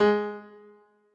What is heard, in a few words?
piano